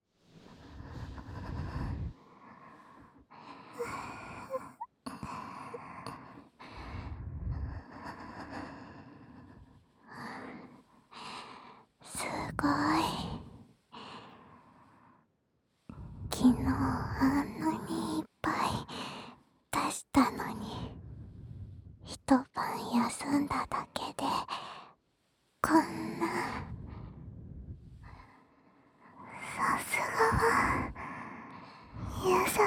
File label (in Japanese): 布団で両耳密着ダブル素股マッサージ, ASMR